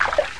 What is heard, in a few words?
drop; physioex9